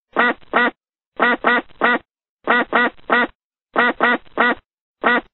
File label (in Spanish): Cuack